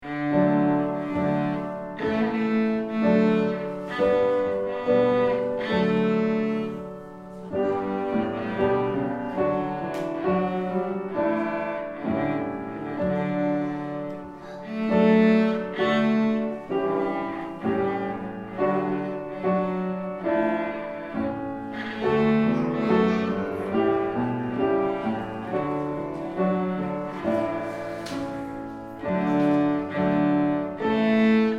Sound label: Twinkle Twinkle, cello